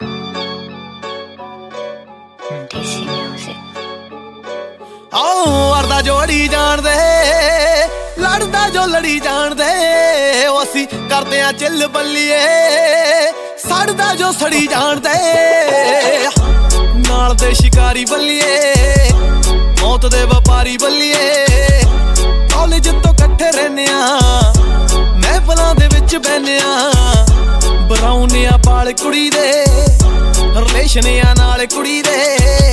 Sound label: (1Min Music)